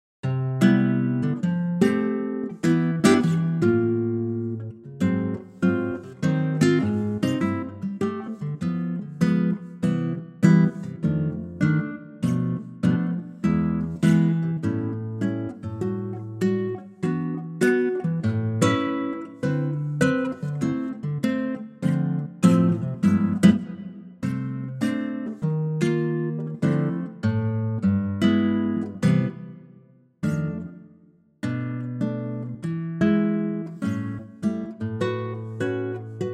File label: C guitar; key - C - vocal range - G to B; Wonderfully expressive and lightly swinging acoustic guitar arrangement; GuitarTrak